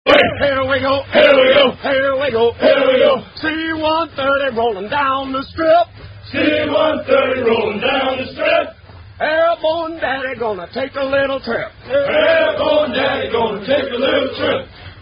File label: Running Cadence